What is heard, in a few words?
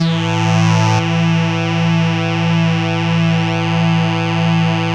YAMAHA CS80 PWM, Vintage Keyboards; Big Fish Audio - First Call Vintage Keyboards